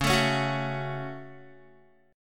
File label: C sharp Suspended 4th Sharp 5th, Guitar; strummed; 9,12,11,11,9,x